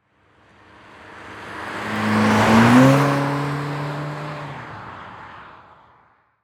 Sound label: Transportation Cars Honda Civic Drive By Fast